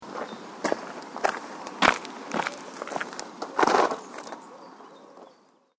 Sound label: Gravel Path Walking Past, footstep sound effect; outside ambience